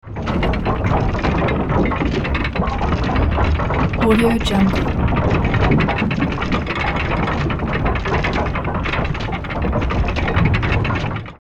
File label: castle door slides open with chain winch; sound-ideas